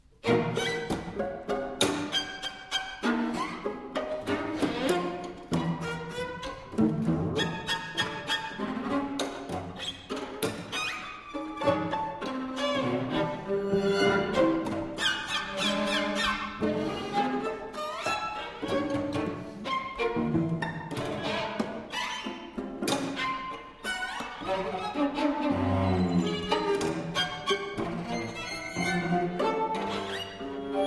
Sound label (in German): Streichquartett